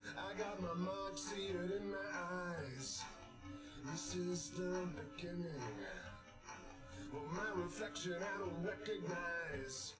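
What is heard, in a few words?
with drums live rec; SiSEC10; underdetermined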